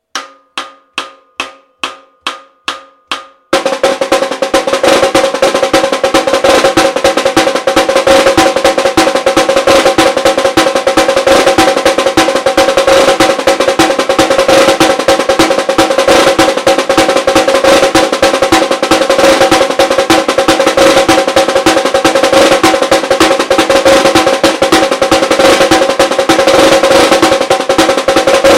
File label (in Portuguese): batucada caixas caixa 144 bpm